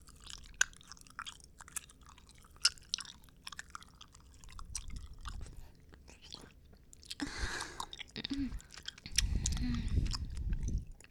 フェチガム ループ両耳 バイノーラル

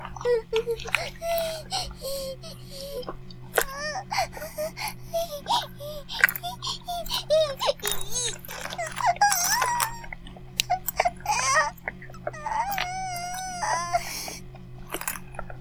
バイブ放置; イクたびにわけて

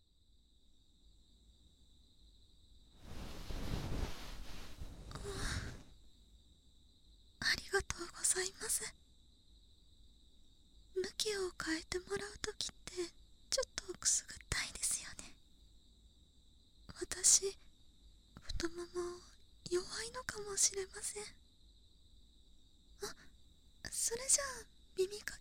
左耳かき, ASMR; お隣さん秋

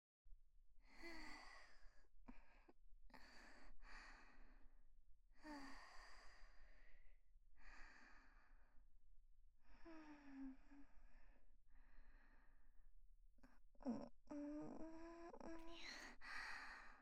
寝息・彼氏と一緒に穏やかに眠っている彼女; ストレートお姉さん系カノジョ

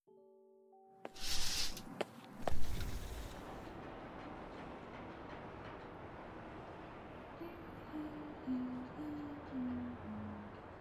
きまぐれキス 臨場集中

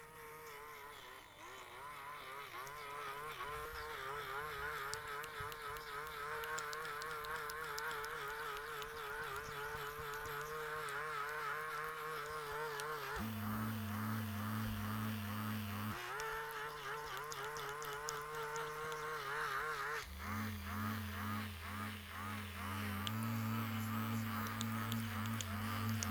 バイブ音

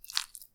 くちゅ音単発, ASMR

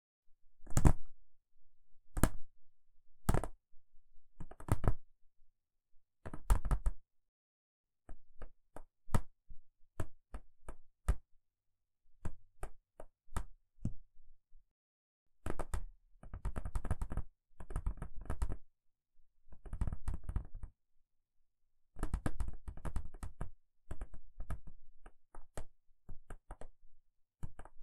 タッピング遊び 左

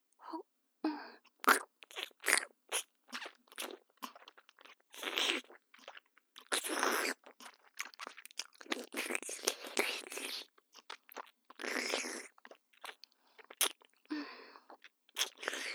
フェラ音

